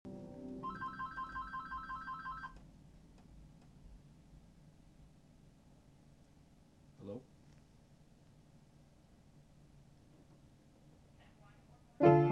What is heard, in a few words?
piano